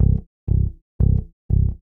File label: Low Quarters, Rock, Bass; ACID MUSIC